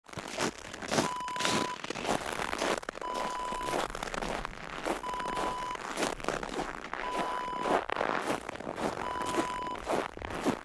footsteps snow

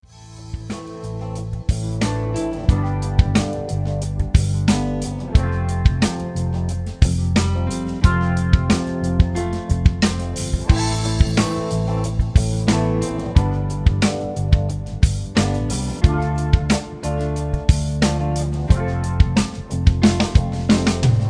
Ballad

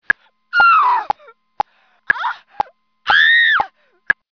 scream

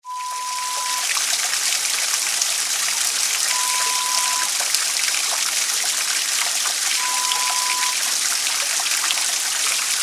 Waterfall sound

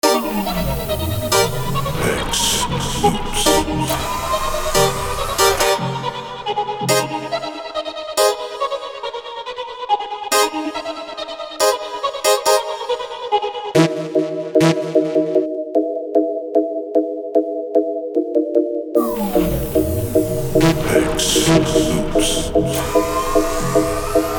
trap essentials synths

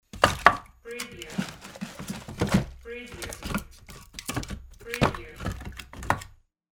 firewood logs

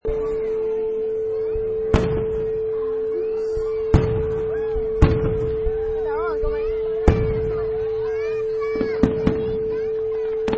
Firework Display

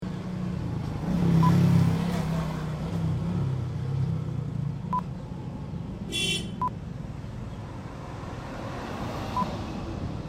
Bali street motorbikes passing

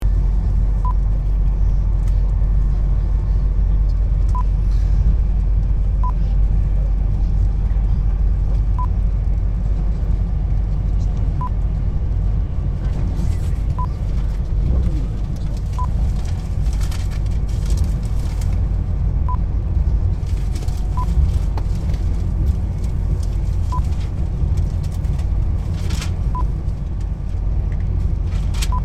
Onboard Modern Train Carriage

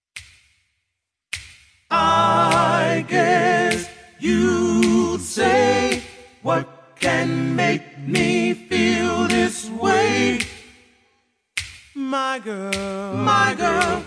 A Capella Version All Vocals Key Of C To D

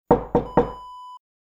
door knock

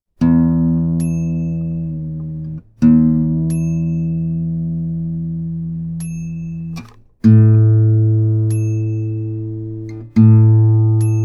Tuning An Acoustic Guitar

